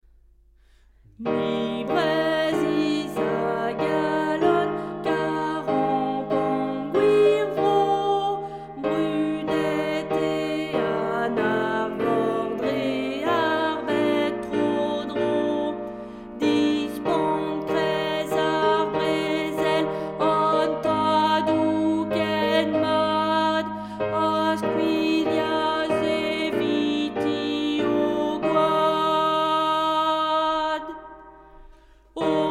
altos; bro goz

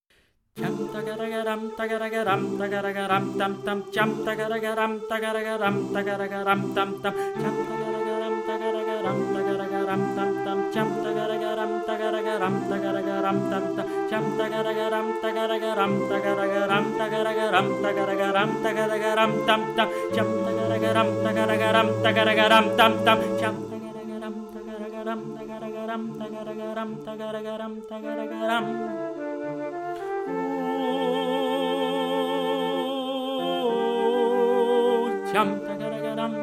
sopranes session

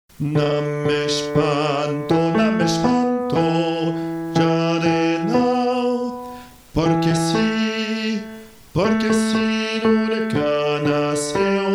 nam m espanto tenor

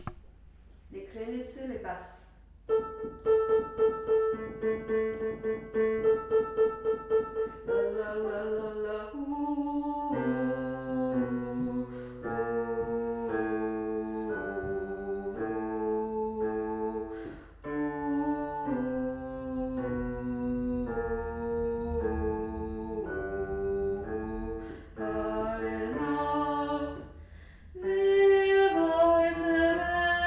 di krenitse basses

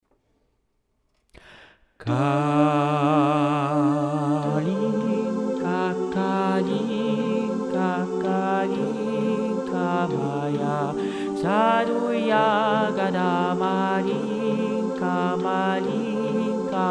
kalinka altos

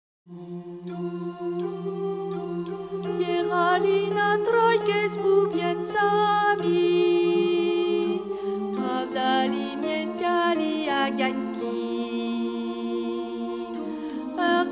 darogoi altos

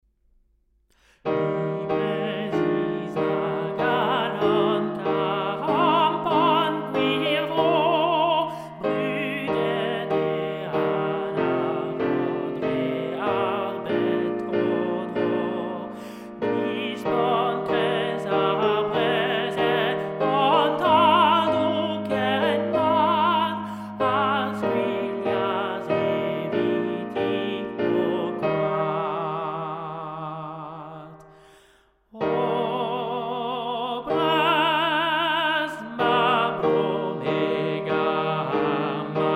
bro goz sopranes

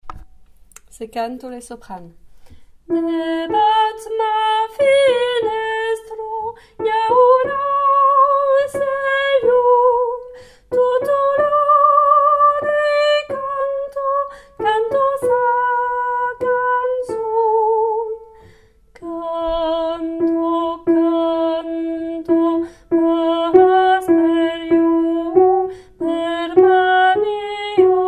se canto soprani

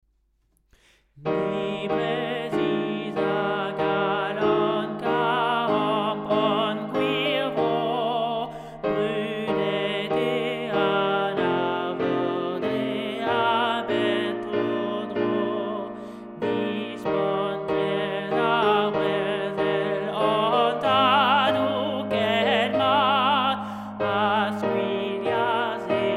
bro goz tenors

